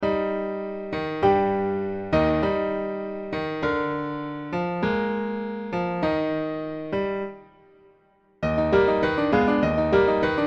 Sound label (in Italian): Finale Presto per pianoforte